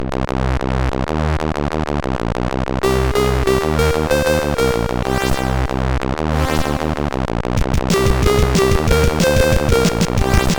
Tune sid MOS6581R3

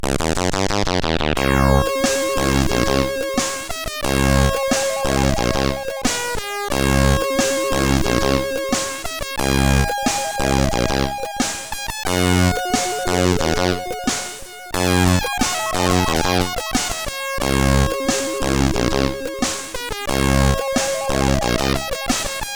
Sad String, CSG8580R5; hvsc

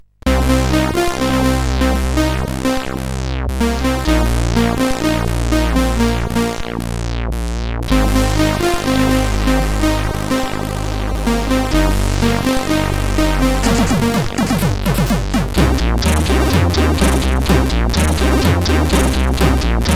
tune, CSG8580R5; Life

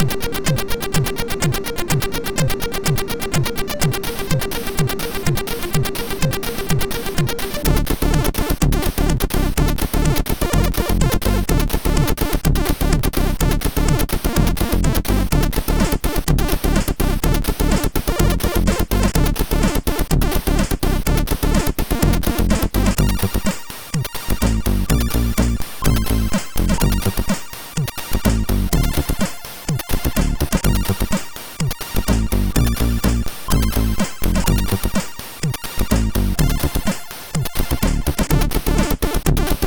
Acidstyle, MOS6581R4

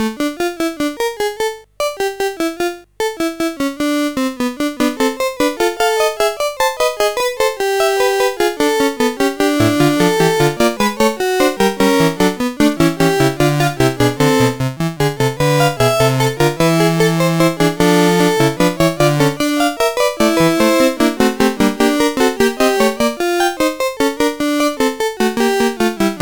Fugue, CSG8580R5

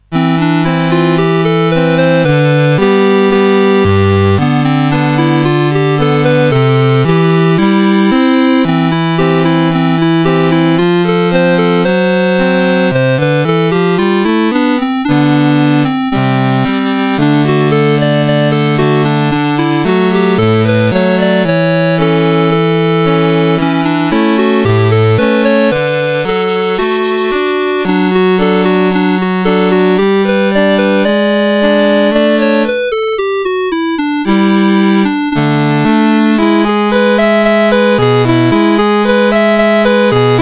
Scales and Arpeggios, CSG8580R5; Diz Cop

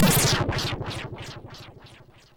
Fuzzball, MOS6581R3

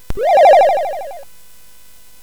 Tecnetium, CSG8580R5; hvsc

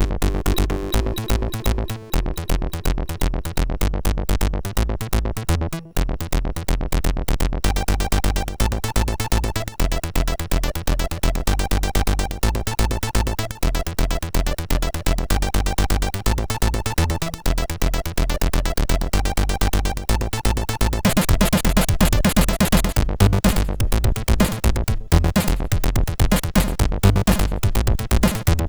tune MOS6581R3

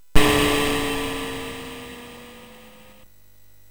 Final Synth Sample, MOS6581R3; hvsc

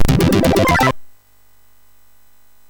Jingles, MOS6581R3; hvsc

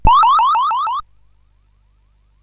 Trance, CSG8580R5; Trance Sector Ultimate

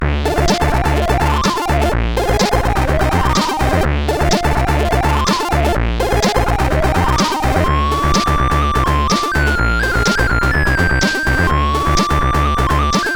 Menu, CSG8580R5; F A M Menu

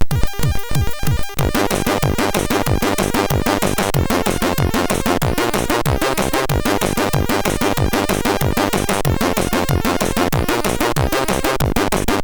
Techno Mix, MOS6581R3; hvsc

